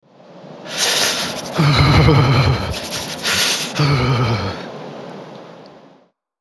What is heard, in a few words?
shivering